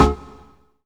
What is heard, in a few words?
riffed, Synths; Notes